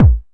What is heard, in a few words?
Soft Kick; Vengeance Samples